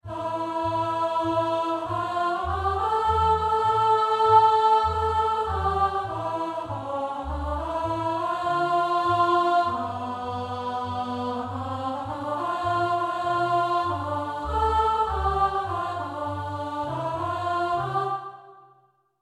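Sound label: Soprano at B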